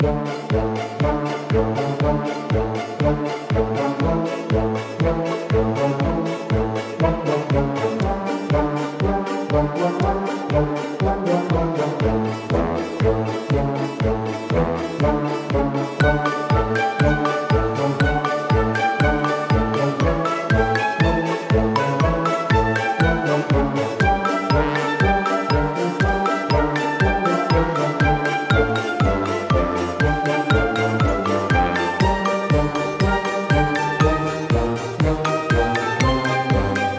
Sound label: Loop, Comedy Kids Background; Travelers Tales